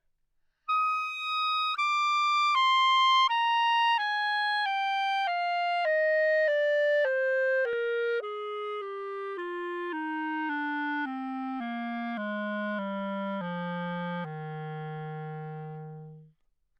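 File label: legato descending, anechoic modern clarinet bb; OPENAIR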